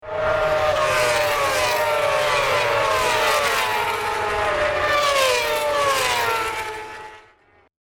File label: Racing SE